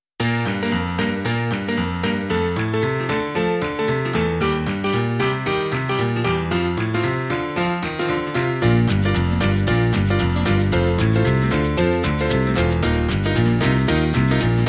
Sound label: Happy Days, No Drums